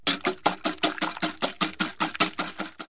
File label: Egg Beaters; Household; Foods